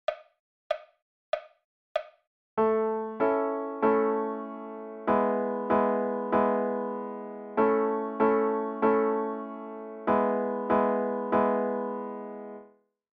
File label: New Note B